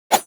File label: Swing, Weapons; Dual Zoren Prime